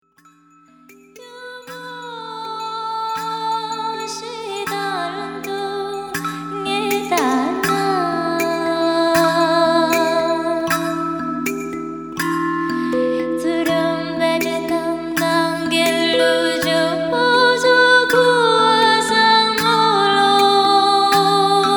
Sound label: live ethnic